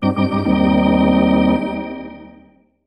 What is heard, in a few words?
Hockey Organ